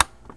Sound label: Click